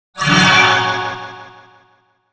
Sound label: Logo Impact Swell Delay; Sonic Logo; Production Element Imaging Element Accent Transition; Blastwave